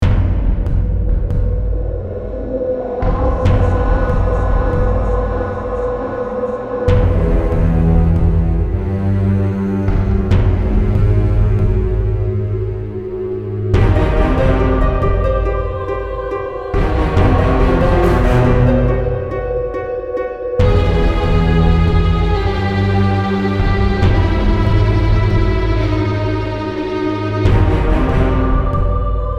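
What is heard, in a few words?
Music Ambient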